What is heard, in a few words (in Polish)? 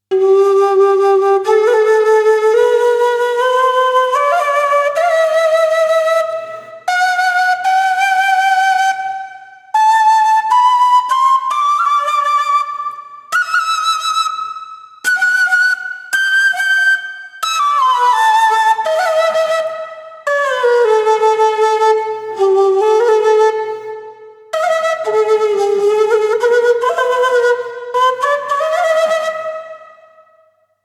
quena flet indianski